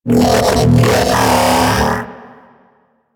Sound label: Mech Ambient Vocal